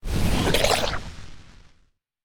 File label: Guardian attack; monster; war3